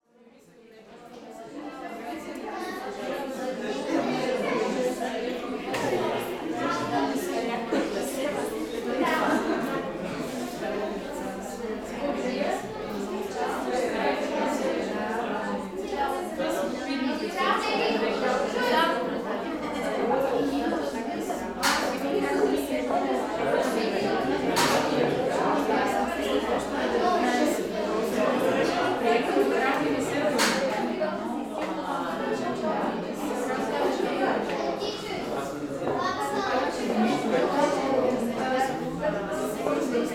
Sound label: Catering Walla Int Exhibition 20-30 People Bulgaria; Zoom H6; West Wolf; Restaurant Ambiences